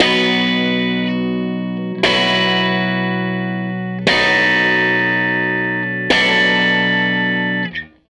Tele Guitar Loop

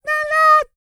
SING, World Voices, CARIBBEAN

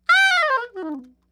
SOP SHRT F#5, saxophones, SHORT FALLS; Greytsounds - Philip Wolfe David Crozier's Saxophones

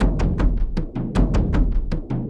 Frame - Kendang Short; Sonic Foundry (Sony Creative Software) - Friendly Fire; Drums

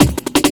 Pickup, Drums & Percussion; Sonic Foundry (Sony Creative Software); Futurist Drum & Bass

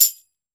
TAMBOURIN

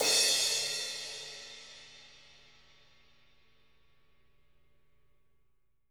crash, Living Drums, JZ CRASHES; Sampleheads; Peter Erskine

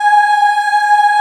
G#4 CHILD EH, Choir; Acoustic & Synth; CHILD EHP; AKAI; USB Soundscan vol.28

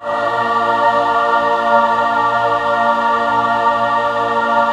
BIG MAJ A#4; BIG CHORD

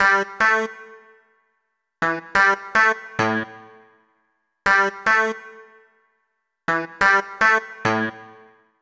S SYNT1, Roots Funk; USB Soundscan vol.41; AKAI

